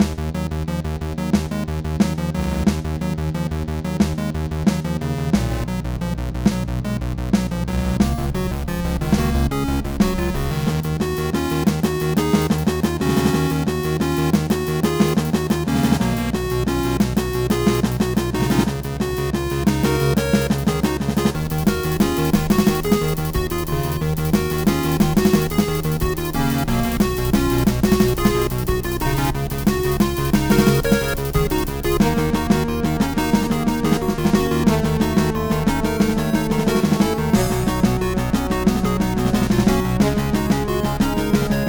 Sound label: final stand phase heavy drums